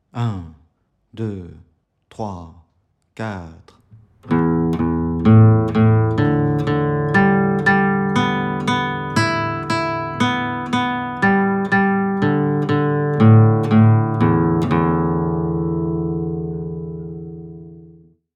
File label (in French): exercice d 060bpm